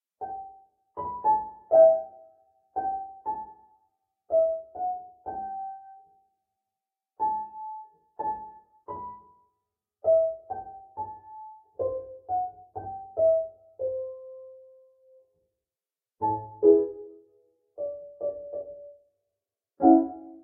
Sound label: Piano; Sherlock Holmes The Devil's Daughter